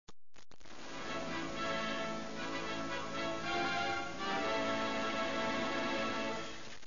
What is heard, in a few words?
fanfare